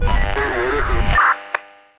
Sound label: airstrike